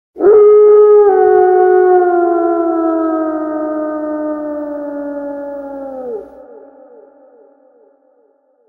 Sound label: wolf Howl; Shamans Dream 2